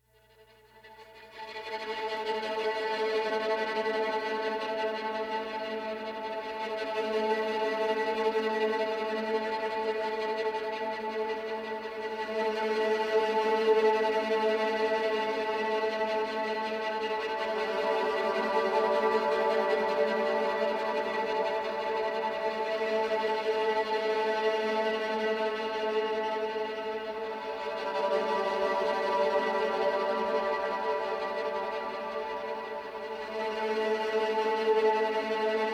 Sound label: String Drone; Nordic Nights Drones